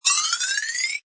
Cartoon Glimmer, Sweep up, zip up, swirl up, magic twirl; Comedy/Cartoon GLIMMER; Funny Sound Sound Effects; Lynne Music